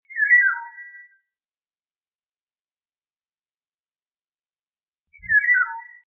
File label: oriole call